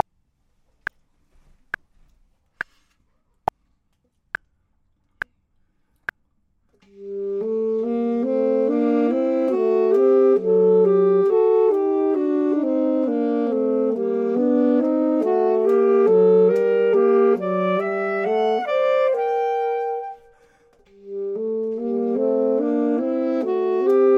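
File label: both, Saxophone and Clarinet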